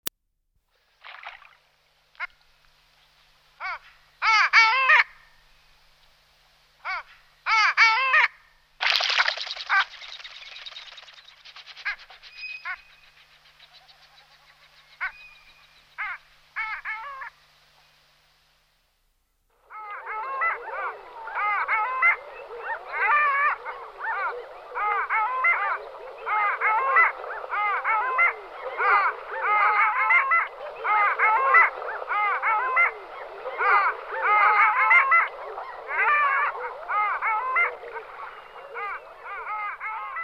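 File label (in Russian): moryanka golos, long-tailed duck voice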